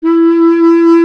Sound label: B. Clarinet; Miroslav Vitous - Solo Instruments VOL-1; 90 s Sample CDs